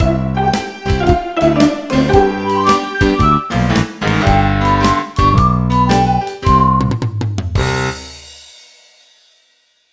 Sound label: jngle; lebercast